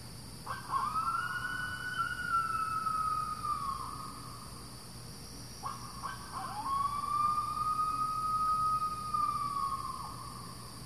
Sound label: coyote howling